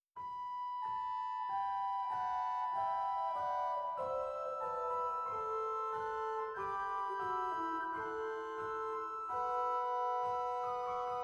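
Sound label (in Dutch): Hoofdwerk Nasaat 8; zwolle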